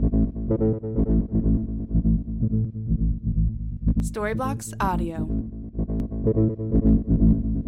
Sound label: Ambient House Bass 125BPM A